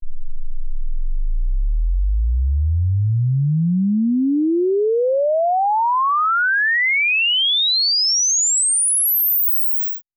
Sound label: exp swept sinus 10Hz 24kHz